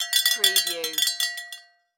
Cow Bell Ring Dinner

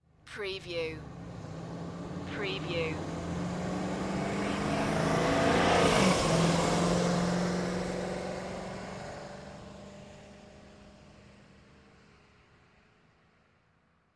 Auto Pass By; sound effects bible

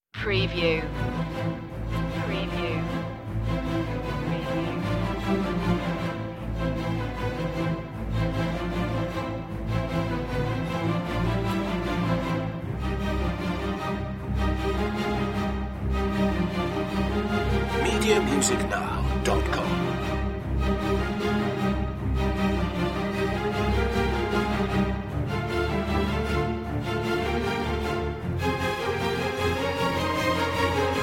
orchestra mix

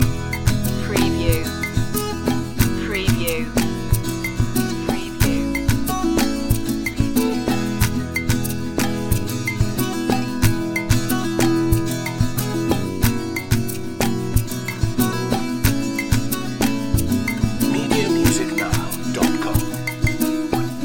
violeiro loop

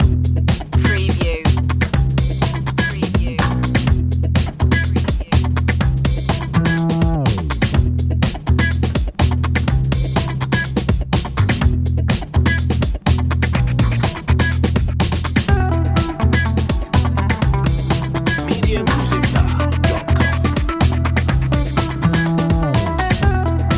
funk

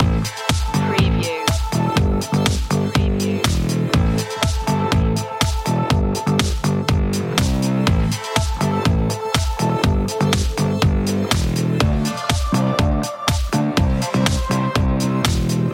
future funk loop

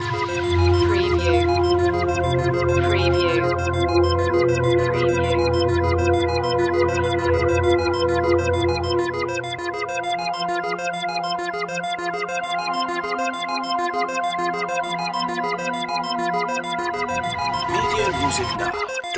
alien landscape loop

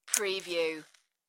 Match Flame

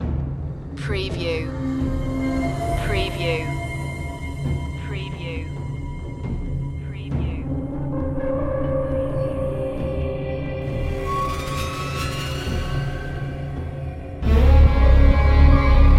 no vocal

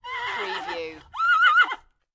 Horse neigh

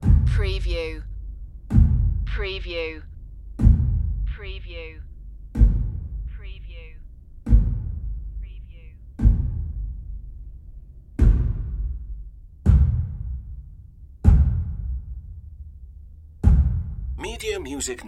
scary impacts

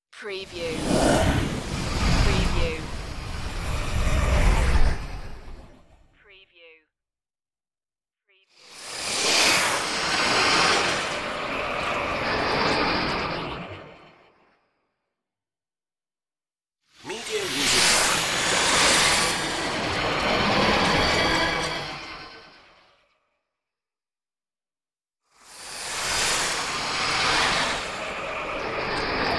spacecraft platoon flybys

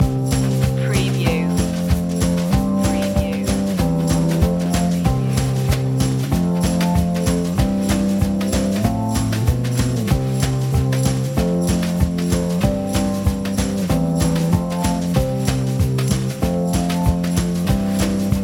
conifers loop

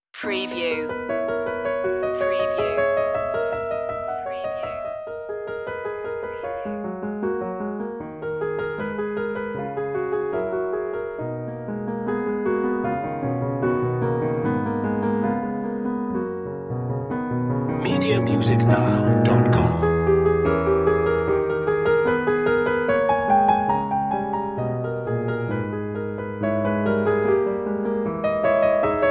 bach the well-tempered clavier prelude; prelude and fugue; bwv 868

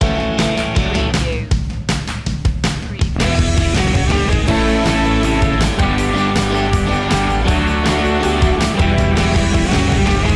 moving forward

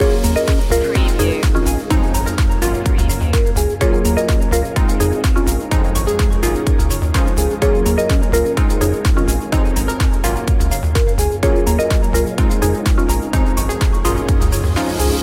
project positive loop

